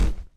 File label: body medium impact soft